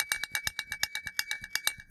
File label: bottle, ethno, 125